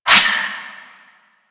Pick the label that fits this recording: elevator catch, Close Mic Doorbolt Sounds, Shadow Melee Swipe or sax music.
Shadow Melee Swipe